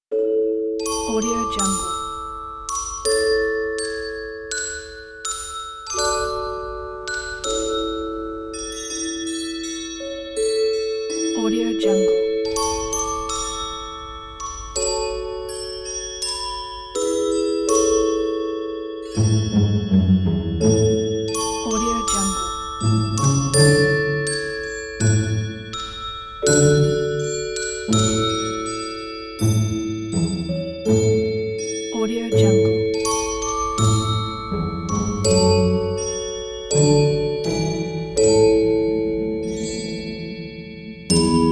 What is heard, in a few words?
cute bells